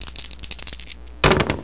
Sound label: roll dice